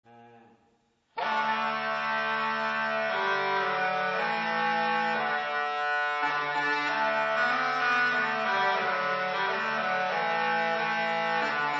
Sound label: double pipes